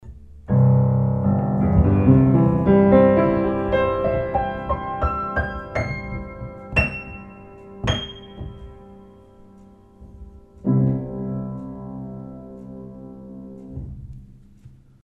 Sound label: Piano